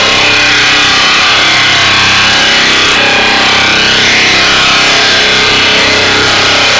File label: manhack loop close; npc; Half Life Alyx